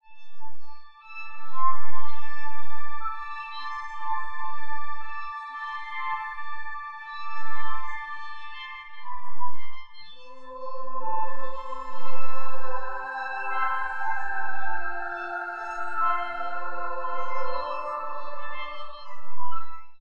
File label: music